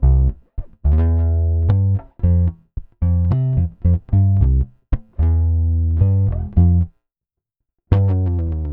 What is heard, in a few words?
Pop, Bass